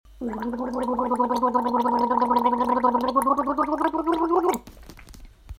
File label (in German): Geräusch